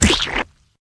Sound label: throw cream pie